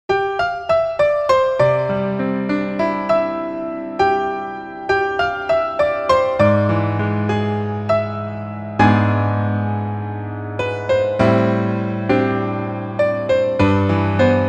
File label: Piano ver